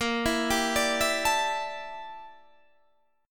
G Minor 6th Add 9th Bb

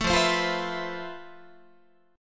F Major Sharp 11th, Guitar; strummed

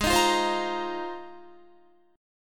Ab 6th Add 9th, Guitar; 16,18,15,17,17,17; strummed

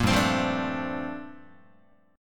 G Minor 11th G down Guitar; Gm11 strummed; JGuitar

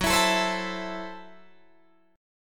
Gb Minor 7th Flat 5th, Guitar Drop D

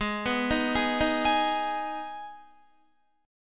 Ab Minor Ab down Guitar; arpeggiated

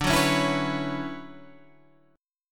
D Major 13th D down Guitar Half Step Down

